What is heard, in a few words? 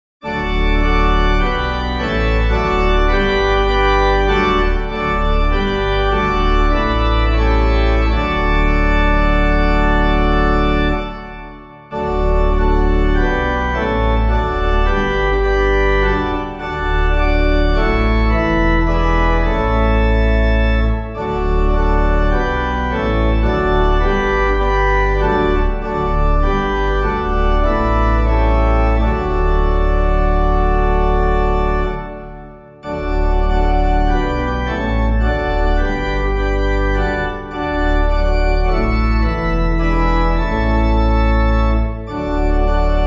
Thou Art the Way to Thee Alone, TALLIS' ORDINAL, organ, hymn